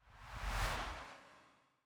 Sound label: big whoosh